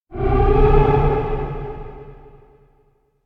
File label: elec whale roar; anemoi